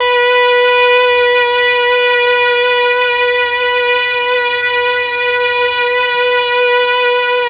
MEL STR, Legendary M400; ILIO; Propeller Island

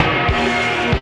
GRUNGE; AMG - Vince Clarke Lucky Bastard

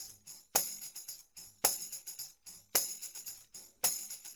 tam, Percussion

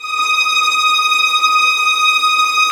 STR TREM, Vlns Tremolo; Roland - String Master Series

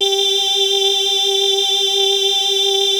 WAVETABL2 G4; DIG MYSTICS

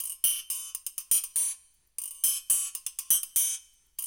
DNCE, World Dance, Dance Coil

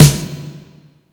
Snare Snappy Electro, Yamaha PSR E305

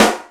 arid snare; Greytsounds; Scott Peers Sound Engineering

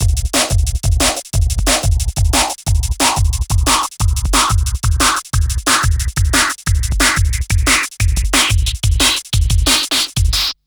Future Beat, Drum & Bass; Fluid Dynamics

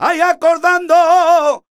voc c#, Flamenco Vocals Male Cantaor; Male Cantaor 2

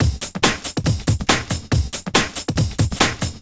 Break Beats, 140 BPM

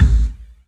LEDRMK, 3D Drums, LESLIE KICK

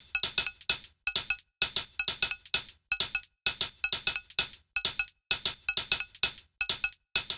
Hh-Cb Loop 130; Equipped Music; Loungin House; Hh-Shake Loops 130

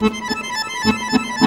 ACC TRILLER; Best Service; World Colours

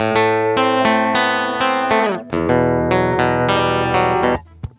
GUITAR SAMBA

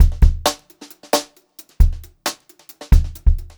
Brushed Drum Loops

